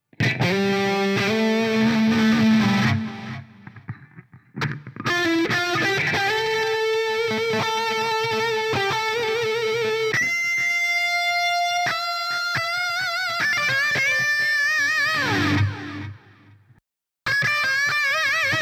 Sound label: Music guitar; Plants vs. Zombies Battle for Neighborville; GnomeGnight